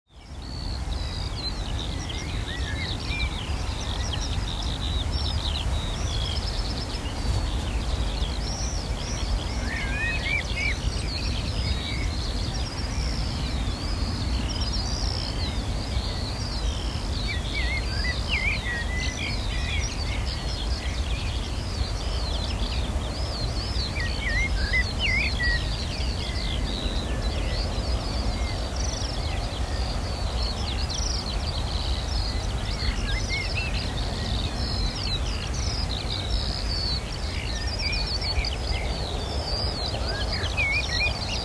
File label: court farm birdsong; adisham